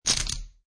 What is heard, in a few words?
Poker Chips Rattling; Gambling; Casinos